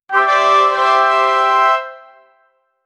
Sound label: memorable trumpet and dru, festive